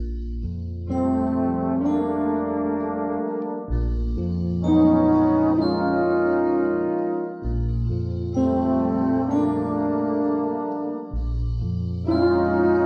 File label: horns sour notes, jazz loops